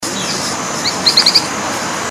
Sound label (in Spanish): pijui plom